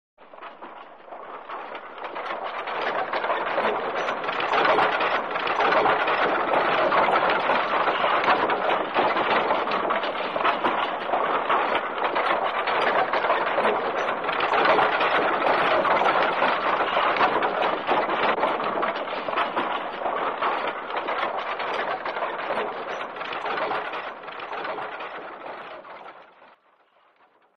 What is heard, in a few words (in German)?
fuhrwerk